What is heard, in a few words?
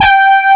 G4, ANA HRN